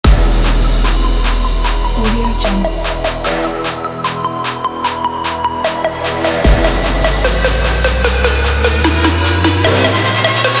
trap

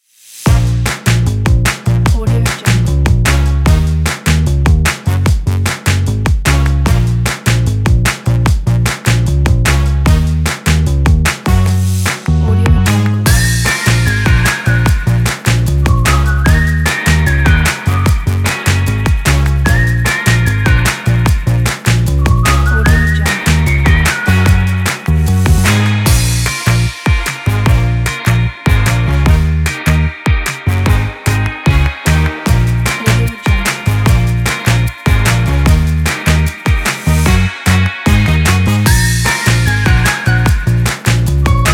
quirky happy fun

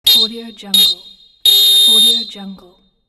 soccer referee whistle game over

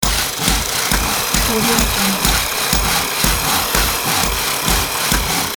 hammer saw and drill loop

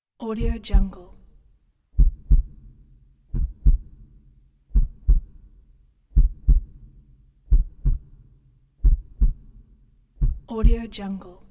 heartbeat

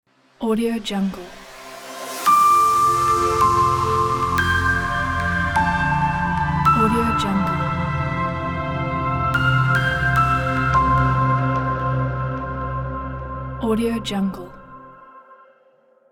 atmospheric ambient logo

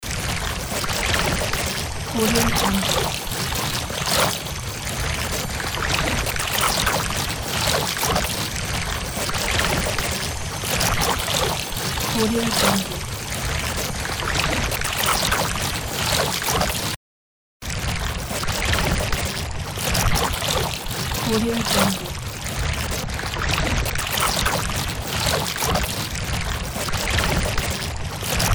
casting water spell loop

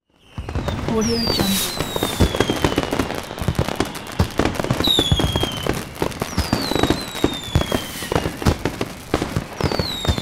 fireworks

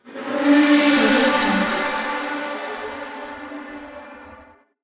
space creature

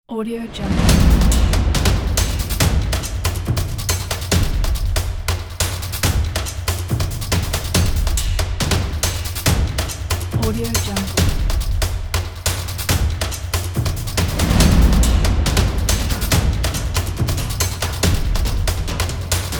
epic percussion

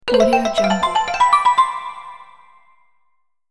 xylophone and mallet notification

